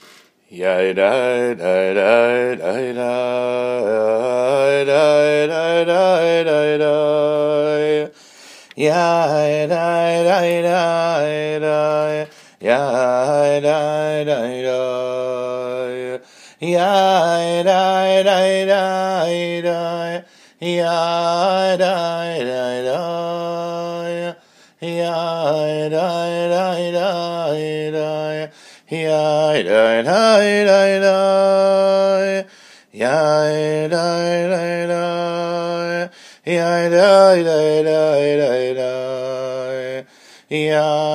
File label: Yidid Nigun